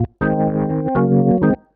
ORGN 123, Keys; Houseworx

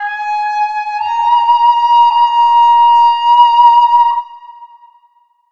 SHAKU LP, Asian Traditions; Soundscan vol.60; AKAI; SHAKU LPS

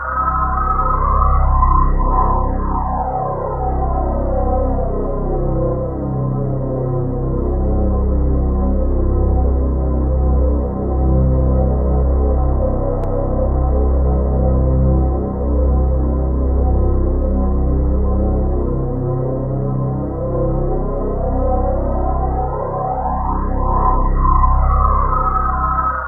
AIR STRG; MORPHEUS

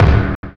kick, Drum and Bass; EZ Rollers; Instrument Samples

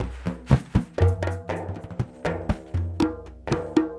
Conga Swish, Drums; Sonic Foundry (Sony Creative Software); Friendly Fire; Marc Steve

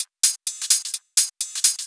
Hihat Loop 128 BPM; House; Best Service; House Definition VOL-1

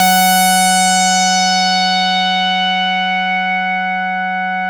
MC 202; Masterbits; Vintage Voltage

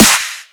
snare dubsteppy

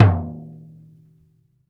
PRC DAIKO, Misc Drums, World Dance; Spectrasonics; Supreme Beats

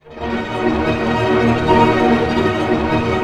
ARP D#, STR ARP MIN; Sound On Sound - Peter Siedleczek's Orchestra

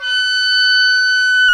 oboe; Roland LCDP04 Orchestral Winds; Oboe Brth 1

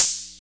FX ANALOG; Sound & Vision - Gigapack II

